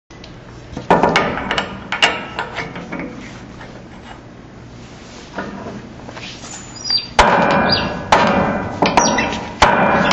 Cisaille à tige manuelle

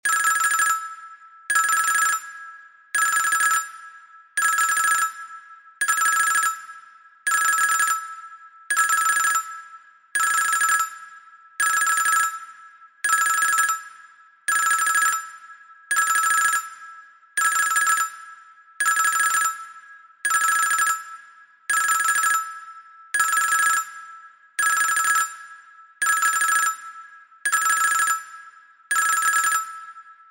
Telephone bielorusse

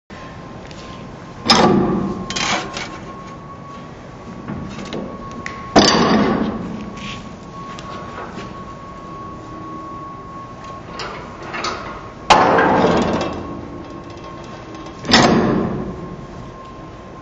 Plieuse

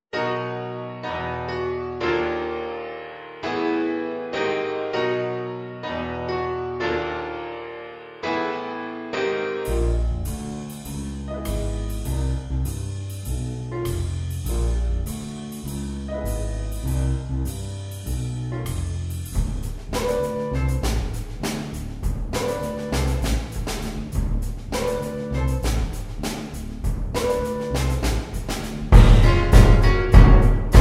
Pot pourri II pour orchestre

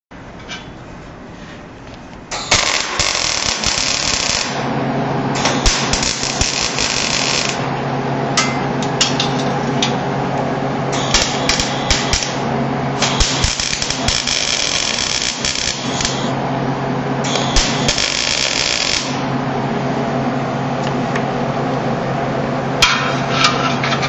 Soudeuse à larc semi automatique

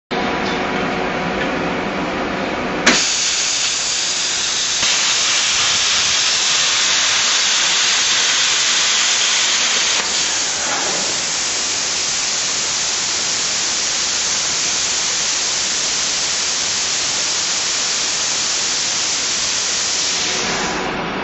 Machine de coupe plasma

